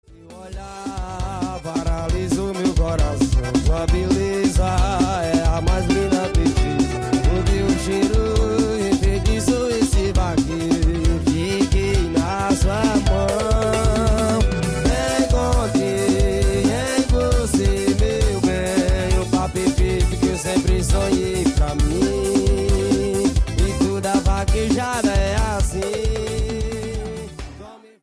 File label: FORRO